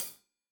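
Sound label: hat closed, hi-hat cymbals stereo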